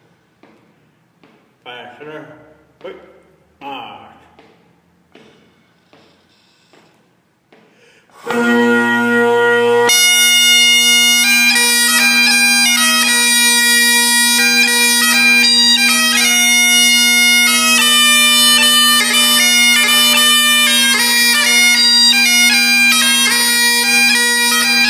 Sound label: March 76bpm; Stone Mtn